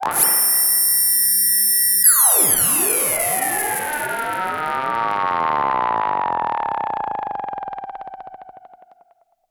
FX, EMS VCS3

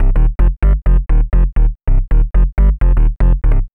Bass, uk garage, 128bpm

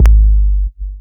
Yamaha CS6, Drums

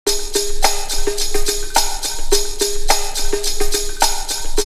Rave drumloops 106; X-Static Goldmine; 90 s Sample CDs

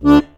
HOHNER, AKKORDEON

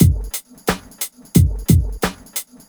FK BEAT, FUNK; USB Soundscan vol.47; AKAI; Hip-Hop & R&B

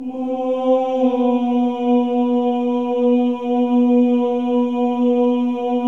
Voices

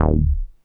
NASTY BASS; Masterbits - Vintage Voltage; VV BASSES

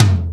Boss DR-550; Drums

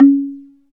Slit Drums, Africa, Wood & Metal

